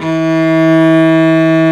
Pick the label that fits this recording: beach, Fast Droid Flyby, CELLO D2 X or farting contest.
CELLO D2 X